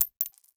Coin Falling on Floor; 1001 Sound Effects

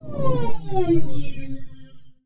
Space Ship Fly By; Sci Fi; 1001 Sound Effects

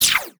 Space Gun; Sci Fi; 1001 Sound Effects

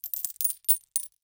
Coins Poured Out; 1001 Sound Effects

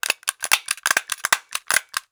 Stapler 5x; Office Supplies; 1001 Sound Effects

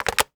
Switchboard Telephone Receiver Down; Communications

